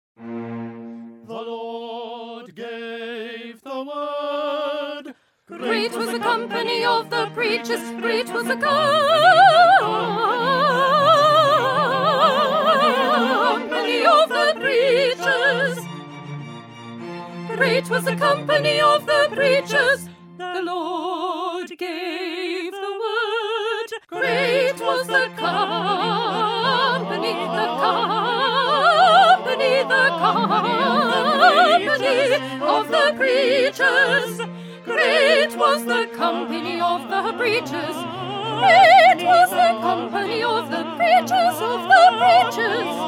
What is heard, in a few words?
Soprano; Handel; Messiah